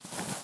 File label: crouch On; Apotheorasis